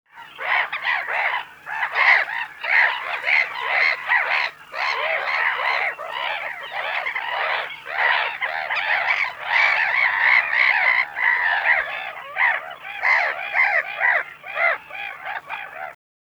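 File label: ozernaya chayka golos, black-headed gull voice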